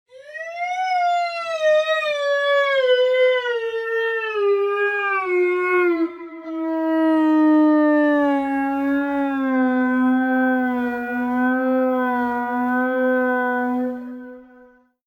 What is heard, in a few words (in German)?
ruine gliss fis h